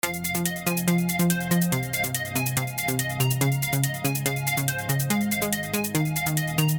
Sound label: Synth Layer Sides